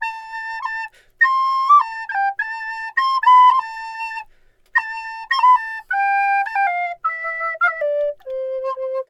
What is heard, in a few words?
Flute Player Melody; The Witcher 3 Wild Hunt